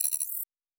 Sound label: Additional Weapon Sounds